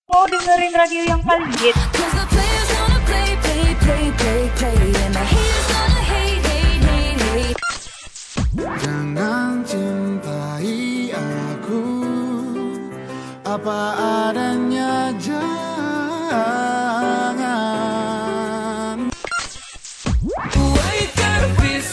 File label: jingle; KBR; zerobyte